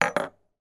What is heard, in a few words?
foley lightbulb down on table; zapsplat